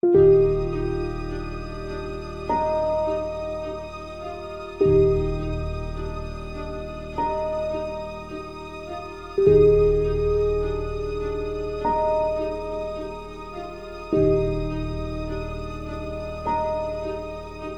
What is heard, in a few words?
minimal chime